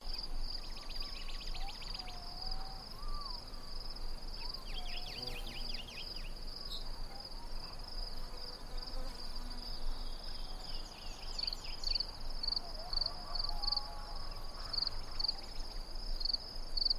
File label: ambience